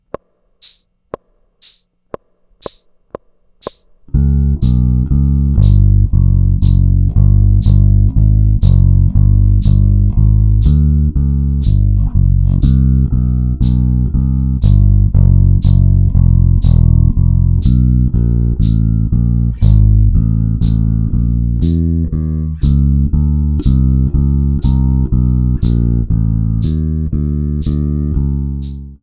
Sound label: Db Blues